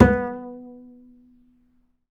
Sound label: B 4, Bass; Spectrasonics; Bass Legends